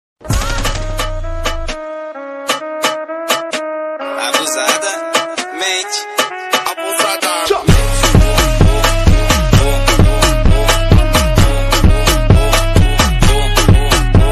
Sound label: remix bgm ringtone dj bass bosted BGM ringtone